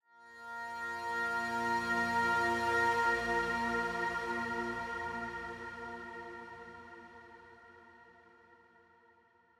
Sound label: Pad Pretty One Shot Bbm 100; Sample Banks; Melody; Keyboards